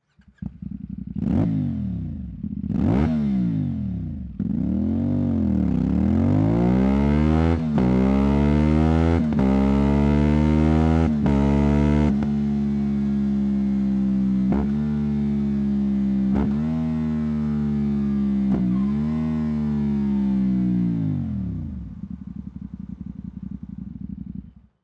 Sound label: SLIP-ON LINE CARBON, motorcycle akrapovic slip-on exhausts; CFMOTO 450SR NK